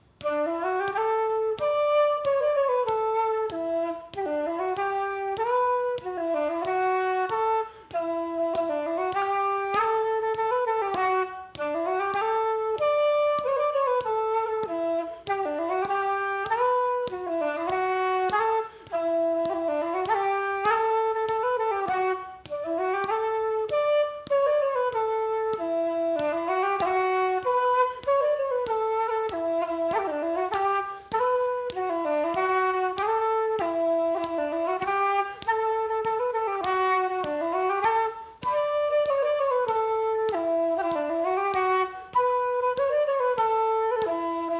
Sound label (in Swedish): Snabba stämman (A och B)